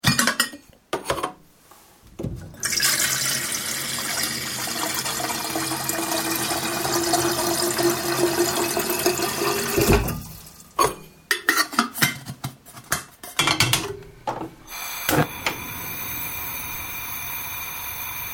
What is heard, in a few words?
Putting Kettle On, Sound of filling a kettle with water and putting it on a gas stove.; Kitchen